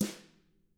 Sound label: TAMA BR MF, Drums; ILIO; Double Platinum Drums 1